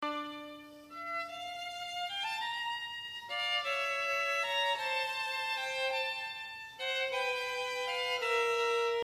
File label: violin